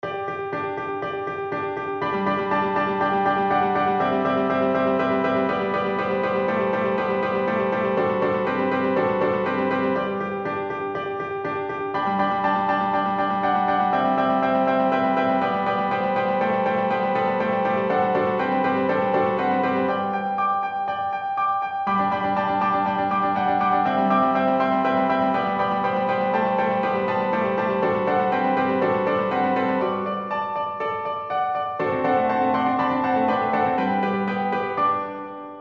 Preludes No.13 in G

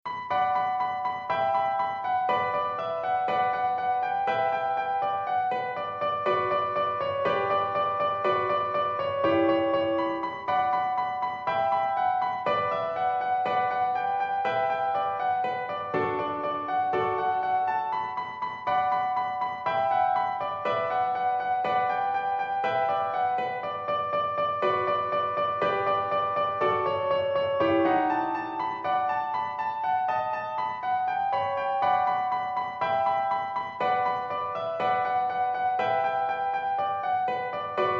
Preludes in Bm, piano

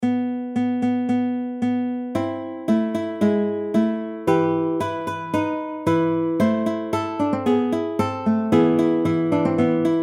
Canzon Francese à 4; Ottava; Sudori Musicali

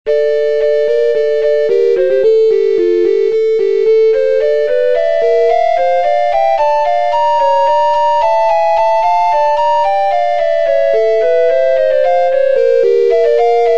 Sonatine langsam; op.127

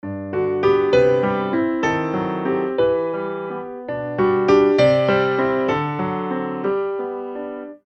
piano

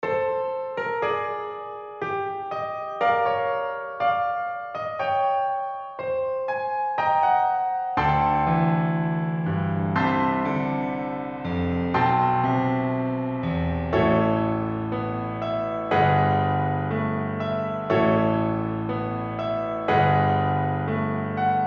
piano Preludes in C

